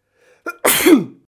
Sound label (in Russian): zvuk chihanija